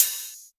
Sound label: short reverb open high hat sound g sharp key